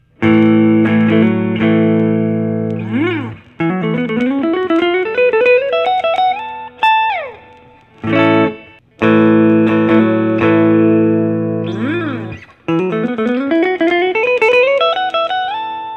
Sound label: gustavsson futuremaster natural